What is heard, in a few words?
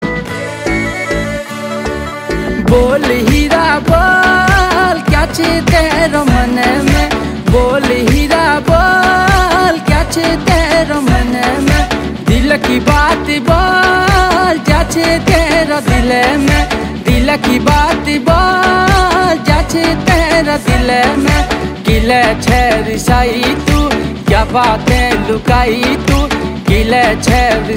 Ringtone